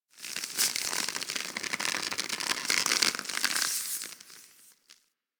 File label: Tendon Stretch Large Close; Ambience; DE; Warframe; Infested Eris